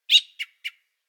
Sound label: bird; Counter-Strike Global Offensive